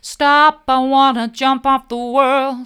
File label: stop i want to jump off the world soft, vocal